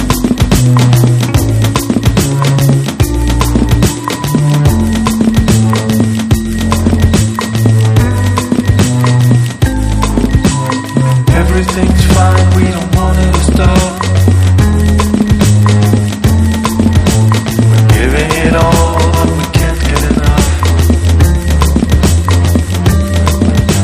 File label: indie dance; LOFI